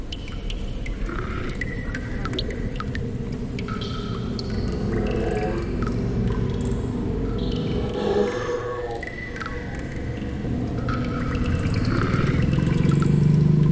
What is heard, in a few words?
Caverns Sounds; quest of dungeons 3ds gamerip 2016